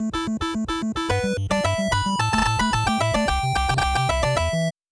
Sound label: Ninja Star; super mario maker 2